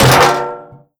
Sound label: vent step, headcrab reviver, creatures